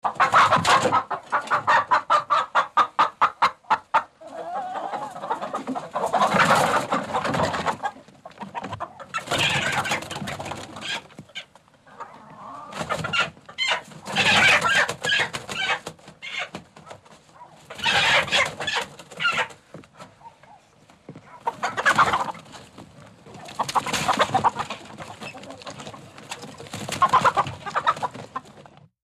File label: Hen Being Chased, chickens